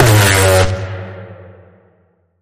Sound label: Bend The Alarm, G